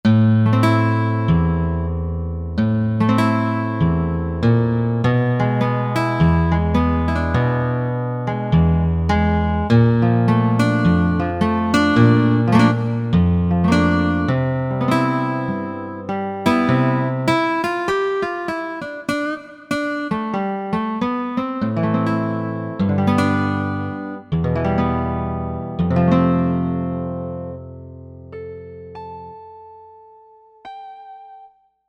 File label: Acoustic Guitar